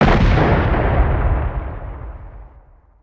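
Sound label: dist explosion, distant explosion, ambient outdoor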